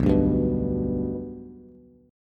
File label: B Minor Sharp 5th, Bass; strummed; JGuitar